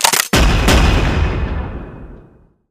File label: powerup pickup knockout; Source Engine; tf